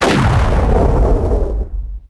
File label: Slomo Start; characters